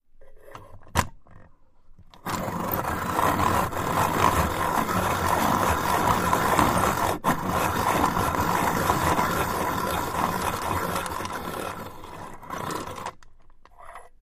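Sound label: Metal Grind Circular, grinders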